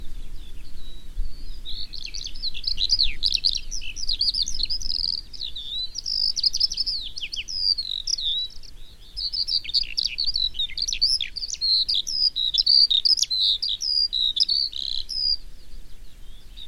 chant alouette des champs

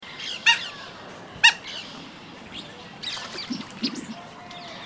canard mandarin, aix galericulata

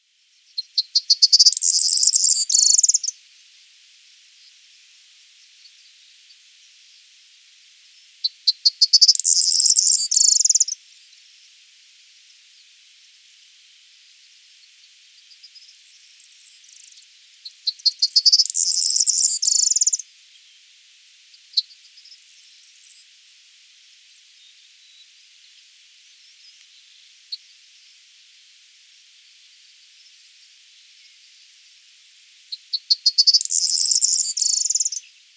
chant bruant proyer

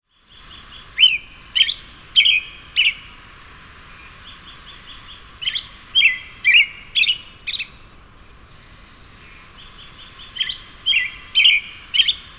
merle d'amerique

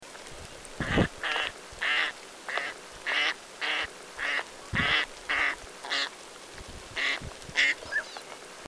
canard pilet anas acuta

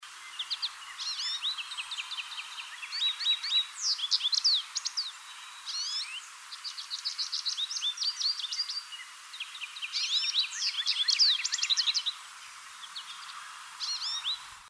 chardonneret jaune

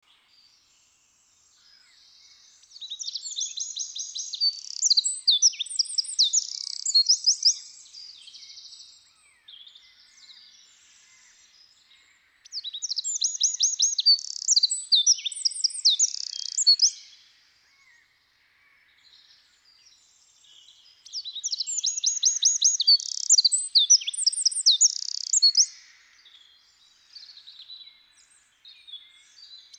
troglodyte mignon

